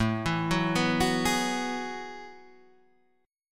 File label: F sharp Minor 11th